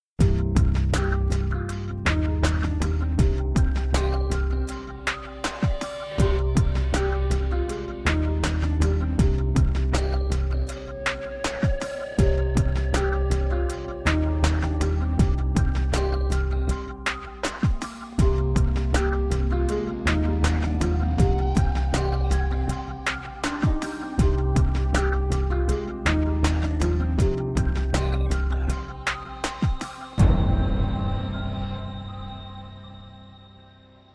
investigation background music

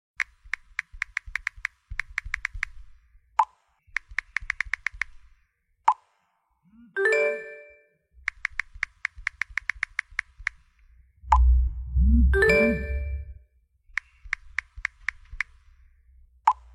WHATSAPP TYPING AND SENDING MESSAGE SOUND EFFECT

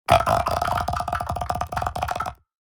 ghost groaning aaaaaaa ghost; ghost jump scar; phasmophobia

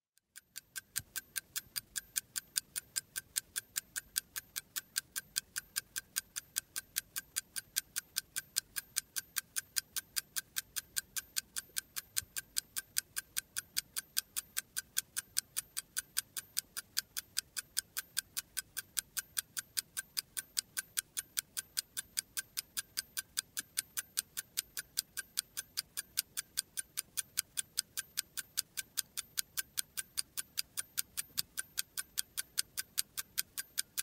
Sonido reloj tic tac Tic tac clock sound FX

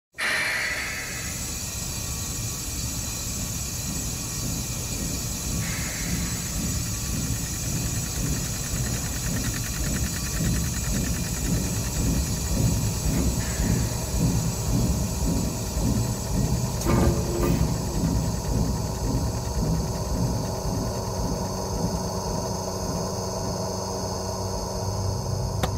Industrial Sounds Factory Machine Sound Effects; Industrial Sounds with Soul; Factory Machine Sound Effects Library; Ann Kroeber

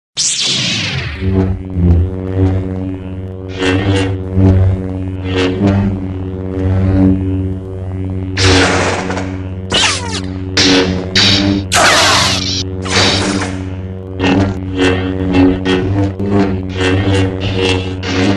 Lightsaber Sound Effect

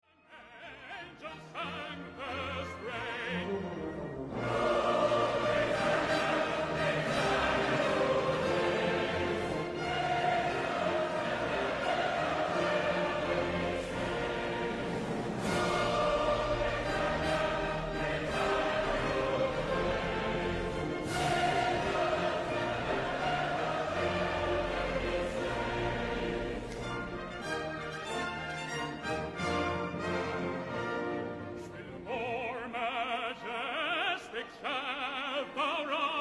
British Patriotic Song; Rule Britannia

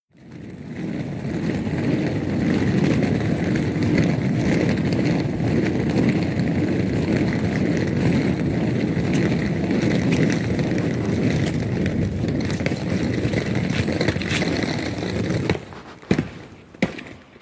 street sound

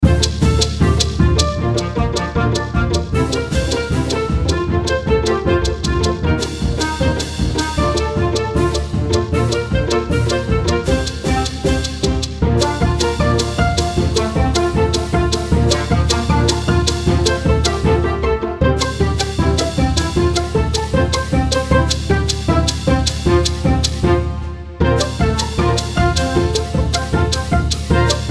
cancan

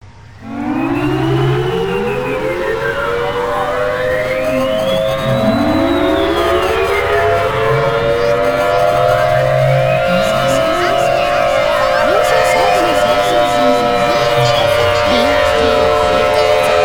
rave

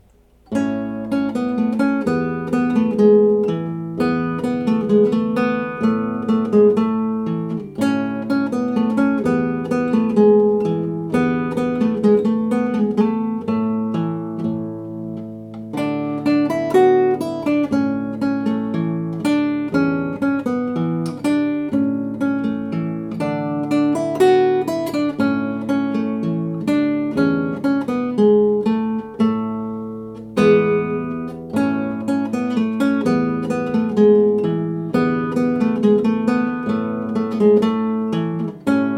Samba Guitare

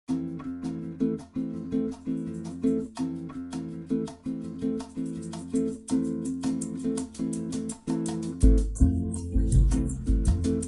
Instrumental; The Backyardigans

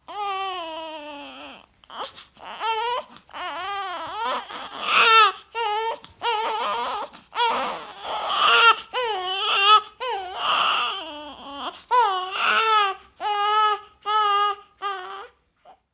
bebe llorando, baby crying sound effect

